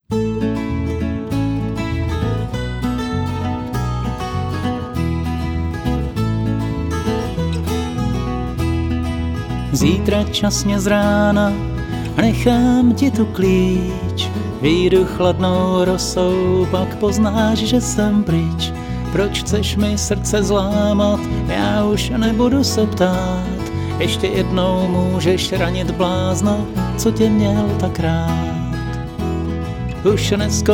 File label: guitar fragment